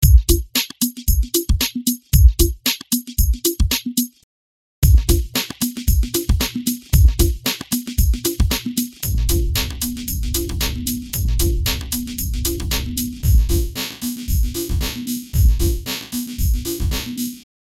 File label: Drumloop denoise ramp; IL Harmor